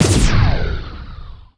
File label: blink; Starsiege